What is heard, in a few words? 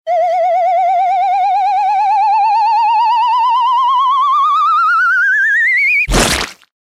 Special Splash